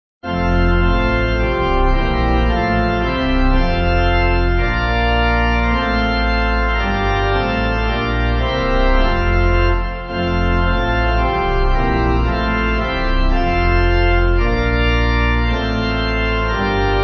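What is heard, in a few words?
Pipe, Pipe Organ backing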